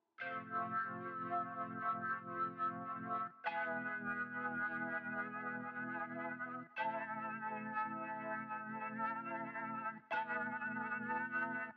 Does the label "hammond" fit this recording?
Yes